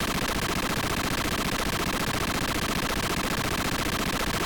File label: Jumpscare; Haste